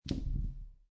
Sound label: Dice Throw Two Dice On Felt; Casinos